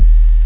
bass; PhatTrax

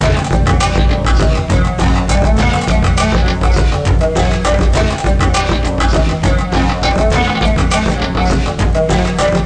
Boot Music Loop

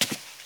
ladder step; wob2 demo

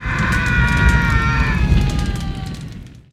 Burn Human; 1944 Battle Of The Bulge

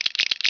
walk; Heretic II

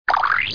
zip Up; Bible Fun Pack; Education Publishing Concepts

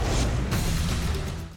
Restore Down; Lara Croft- Tomb Raider- The Cradle of Life

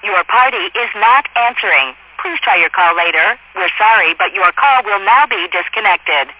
answer; Speak Freely 7.1; Telefonia IP

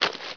step mud; SpecOps2 MPlayer Demo

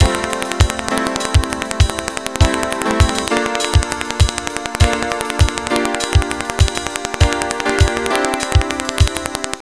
Riff Raff Intro A, Jazz; Sound Factory

